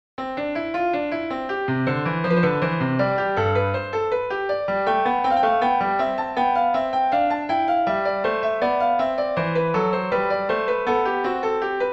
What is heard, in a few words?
Invention, Kontakt, piano